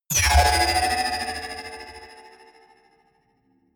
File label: sci-fi magnetic sling big hard; energie